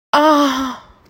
moaning

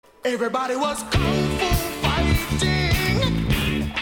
kung fu

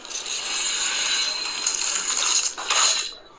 claws slow to fast; wolverine

